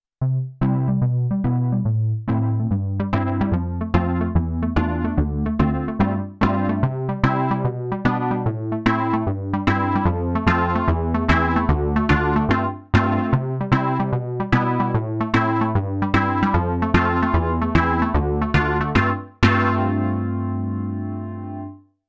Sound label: Rough Pno